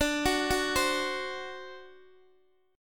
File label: D 7th, D, down, Guitar Open D